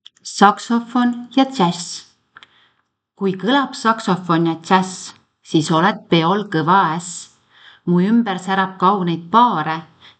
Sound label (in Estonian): saksofon ja dzass